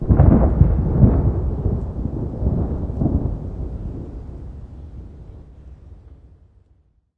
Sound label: Ambient; unreal development kit